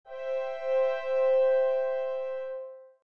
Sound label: harmony unison; Nikolai Rimsky Korsakov